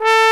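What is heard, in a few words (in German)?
POSAUNE STAKKATO A3; Best Service; Alpine Volksmusik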